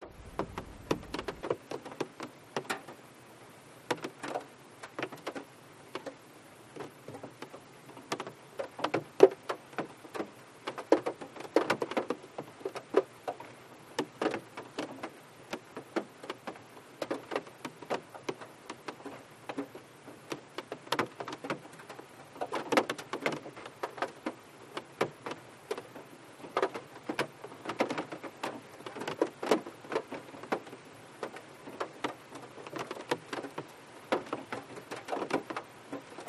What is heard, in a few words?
RAIN Interior Car Light Heavy Drips Steady Stereo